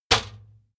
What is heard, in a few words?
trash basket metal hit hard